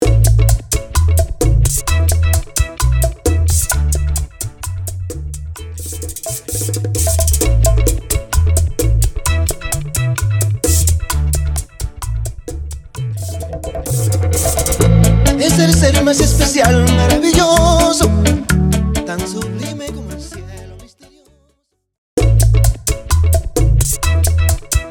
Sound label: Intro Outro Break 130 BPM